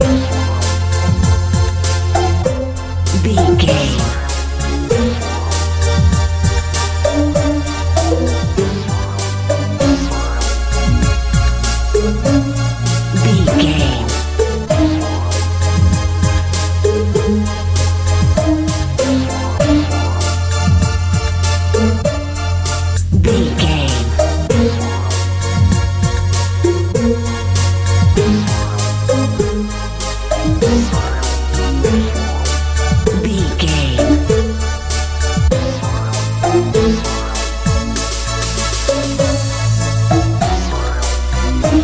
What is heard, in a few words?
colorful hot modern pop